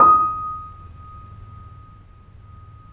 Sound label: D#, The Piano; InVision Interactive - The Piano; BOESINGSOFT